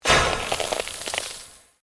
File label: Created Magic Spell Ice Bolt; Series of created spell sounds